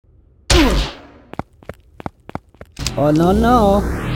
Charge Tackle

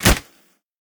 Fishmen Garpun Attack; we are the dwarves gamerip 2016

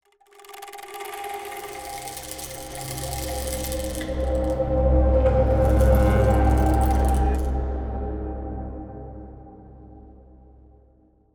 Woosh; From Dust; ps3; xbox 360

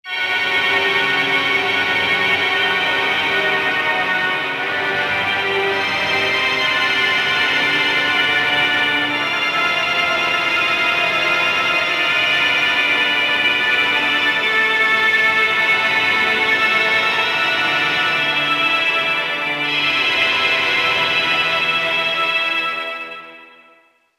Horror Organ; The Darkness 2 gamerip 2012

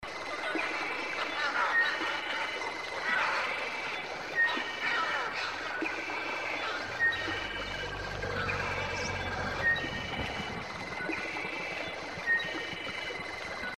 ambience track jungle; dragon fin soup

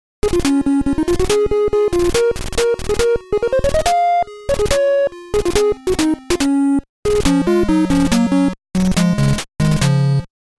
Stage Start & Complete Dixie; North & South; NES

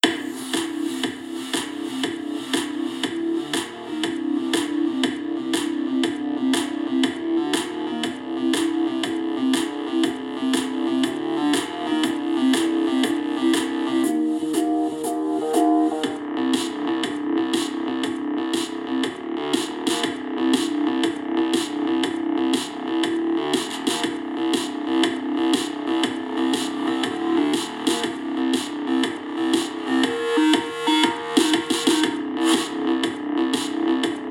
Saloon Bounce; Moxxi

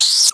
rat hurt; Rats

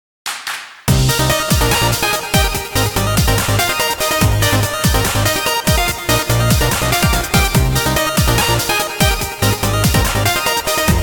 Victory; Watergrav

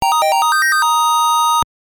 Jingle; muteki ou tri zenon 2001 gbc

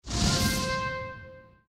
rally trumpets; Fort Triumph

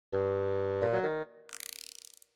Passed; Pictoparty; Wii U

